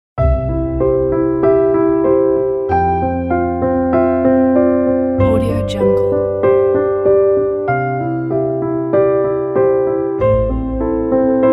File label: on wedding day